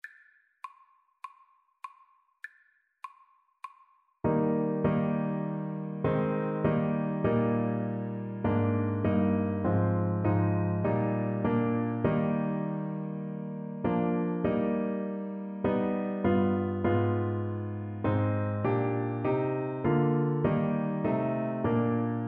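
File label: church one foundation, alto saxophone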